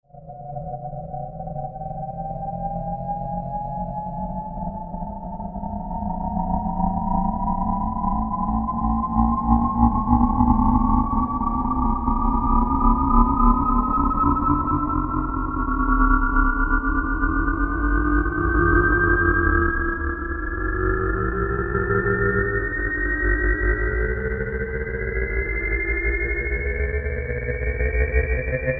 engine charge

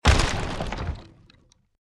wood destruction; out of reach treasure royale 2020 gamerip pc

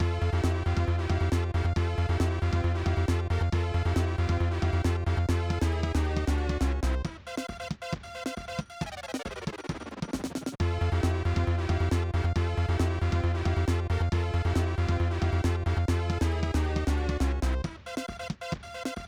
Win Match

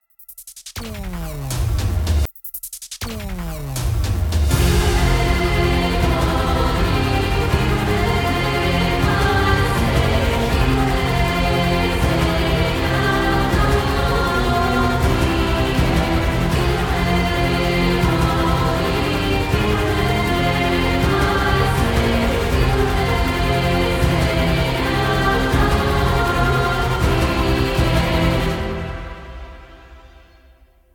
BGM combat mob enemy; Babylon's Fall

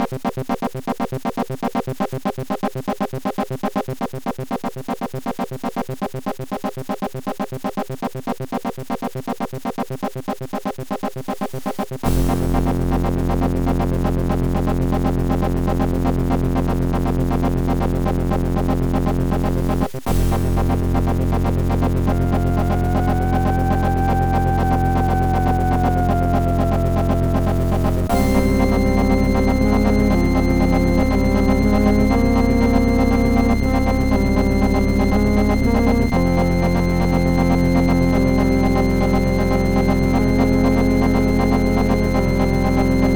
VICTORY OPL3; Abuse

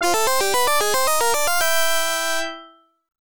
1-Up; Normy's Beach Babe-O-Rama; Genesis

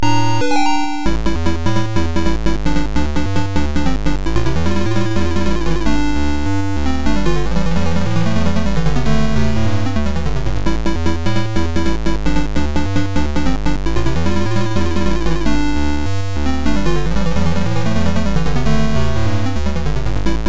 Unused Jingle Main BGM; Arm Champs

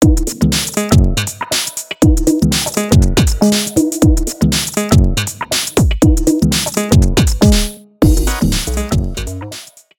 BGM THUMB LOAD; Busou Shinki Armored Princess Battle Conductor; arcade

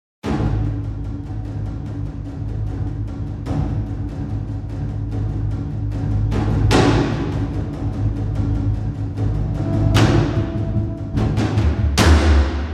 Tension Stinger

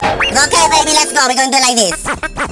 Battle Mode Stage Start

